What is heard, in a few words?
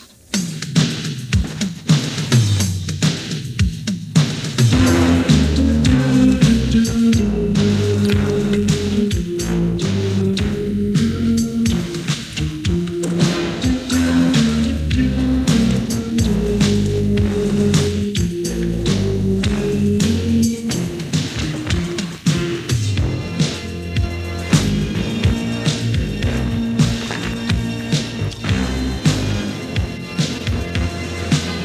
rap inst no gay sex noises